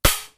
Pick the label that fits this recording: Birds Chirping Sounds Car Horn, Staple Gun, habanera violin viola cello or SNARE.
Staple Gun